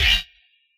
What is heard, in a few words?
Hit L, Yamaha DJX